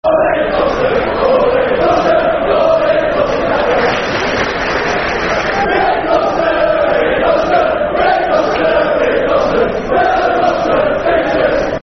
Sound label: Football Chant, Soccer Songs; All Hate Gloucester; Cheltenham Town Ctfc